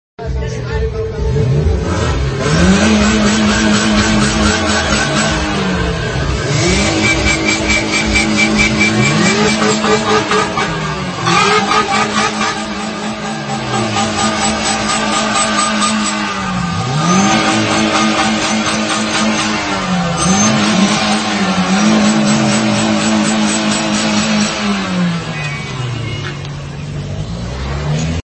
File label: bullet exhaust